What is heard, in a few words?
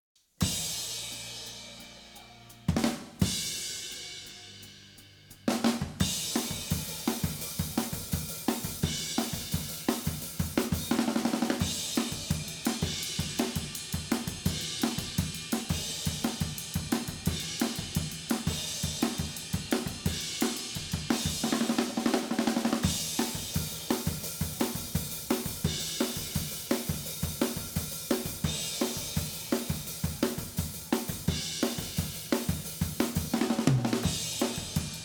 Cymbals; multitrack